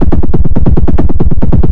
rolling thuddler, drum loops and breaks

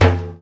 arabic perc, Arabic Percussion Kit

